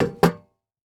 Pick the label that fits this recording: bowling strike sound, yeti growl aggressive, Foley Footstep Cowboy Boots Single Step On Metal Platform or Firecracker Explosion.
Foley Footstep Cowboy Boots Single Step On Metal Platform